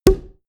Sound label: pop